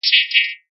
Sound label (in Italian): ciottoli; acque